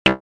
synthlead; pulsar

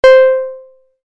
Harp

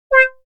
Shorty, synth lead

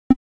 Tristar, synth lead

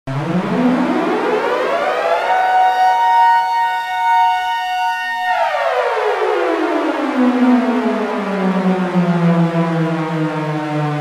Outer Space Alarm

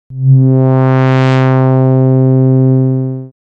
Dream Brass

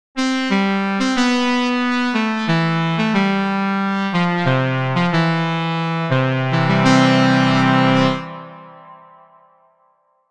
Wide Piece, synthlead

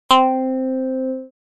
Jaw, synth lead

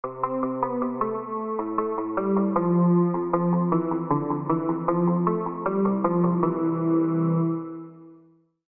synthlead; moonwalker

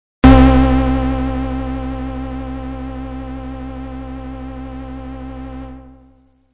Three Pulses, synthlead

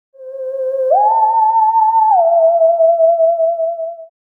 Woman's voice rev

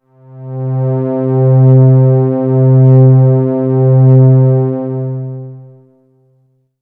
String Pad A

